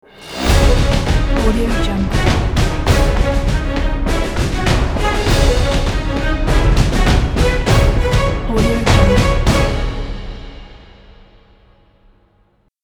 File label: epic game show opener